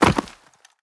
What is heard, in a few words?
body impact